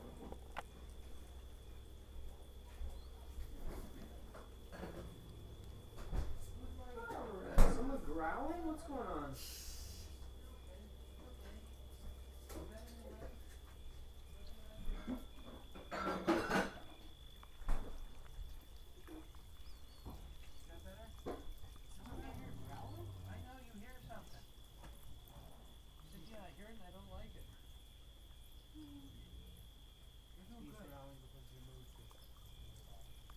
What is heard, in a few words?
Ambient; Covelo